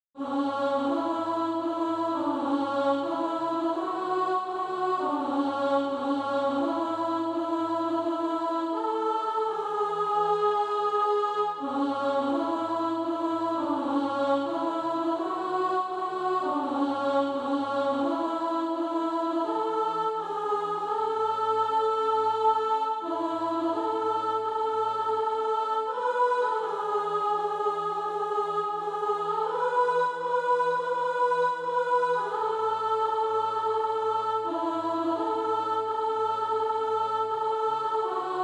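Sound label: alto, Practise Music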